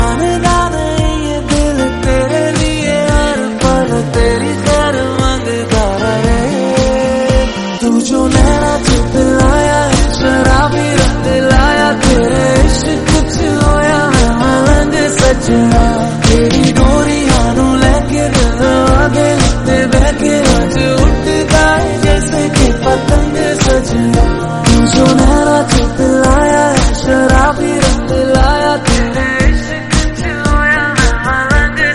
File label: instrumental